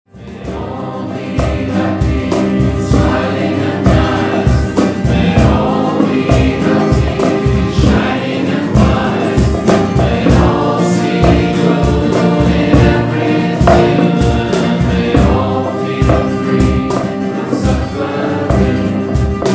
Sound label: bhajan; sarve bhavantu; Jam Band